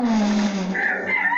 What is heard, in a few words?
rooster; kelloggs